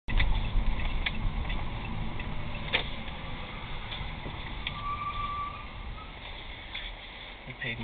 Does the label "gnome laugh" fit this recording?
No